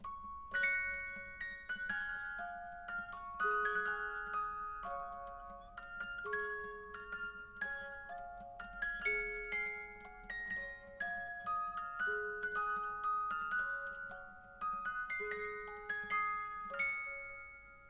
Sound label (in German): Wiegenlied; Brahms